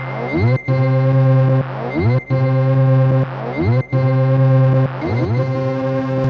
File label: Crude Tape Loop, Tape delay; radiosonics